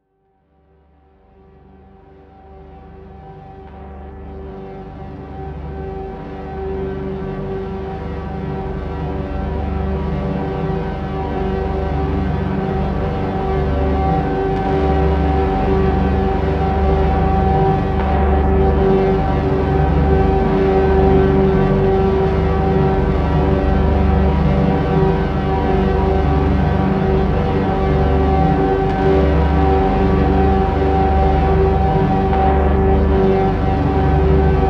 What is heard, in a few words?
Fade Out Guitar Loops